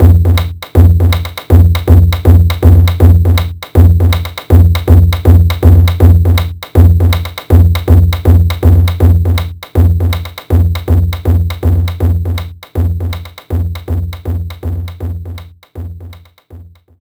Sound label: Drum; the legendary starfy; nintendo ds; The Squirts'